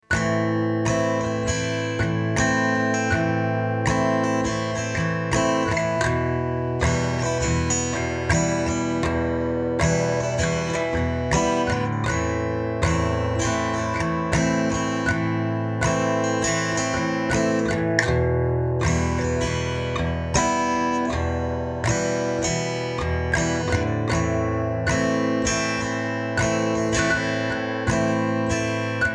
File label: Am Backing Track